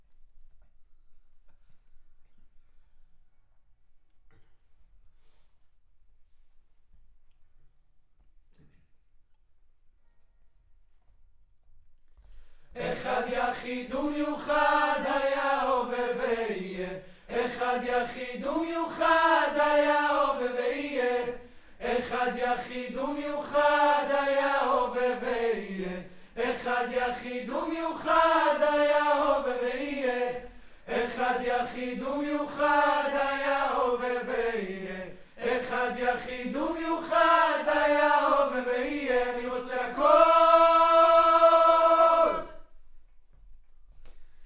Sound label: Choir